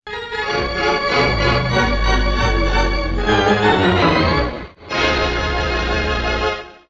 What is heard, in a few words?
Organ; The Interactive Alphabet